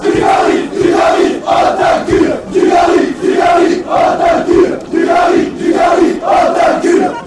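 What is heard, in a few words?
fanchants, football chants; dugarry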